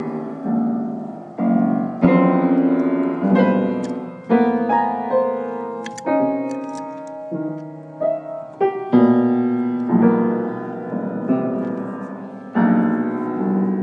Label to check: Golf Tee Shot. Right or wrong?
Wrong